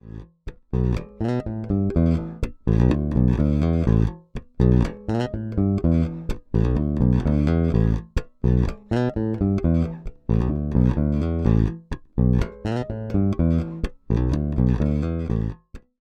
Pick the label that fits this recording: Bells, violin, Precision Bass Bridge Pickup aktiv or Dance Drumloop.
Precision Bass Bridge Pickup aktiv